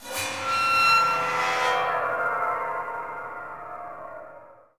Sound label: Hum 2 Flourish; bolt